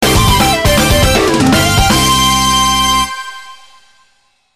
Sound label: Jingles; otome the exorcist